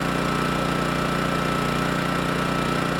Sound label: Amb Generic